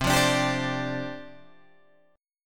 C Suspended 2nd Suspended 4th, down, Guitar, Open G